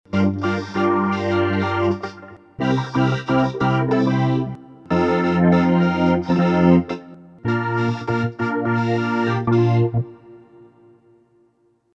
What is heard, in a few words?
Mech Voice; SE VOC